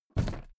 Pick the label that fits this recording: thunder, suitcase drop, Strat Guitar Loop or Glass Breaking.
suitcase drop